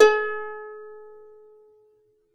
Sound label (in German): HAKENHARFE; SoundBytes; Early Patches